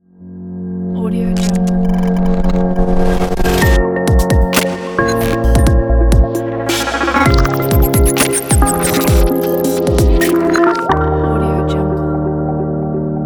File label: glitch logo